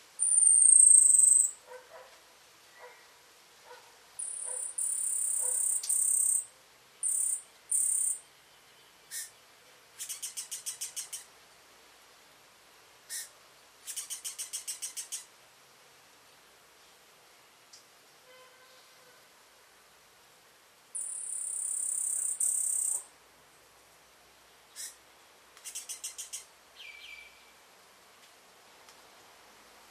Picaflor Bronceado; Chajari

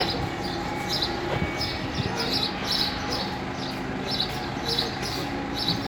Gorrion

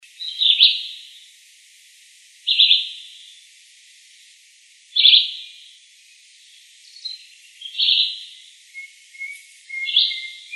Chivi comun; Misiones 2010

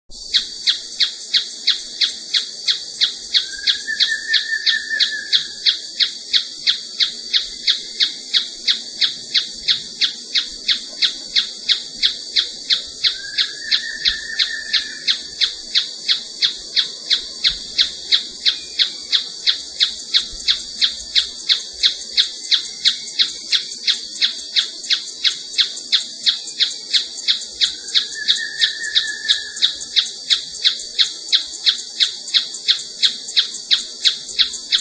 Scytalopus pachecoi y Batara cinerea; Corredor Biologico U F

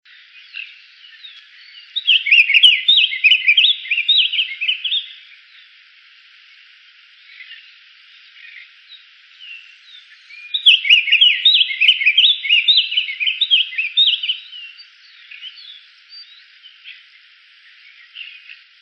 Frutero Azul; Delta

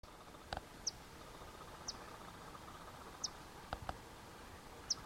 CHINGOLO

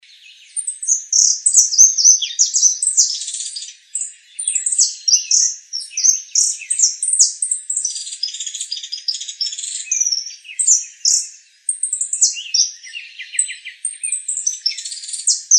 Jilguero Dorado

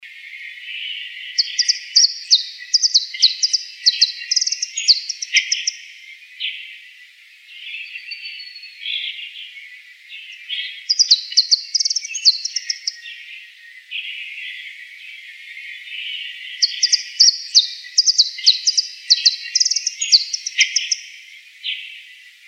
Jilguero comun

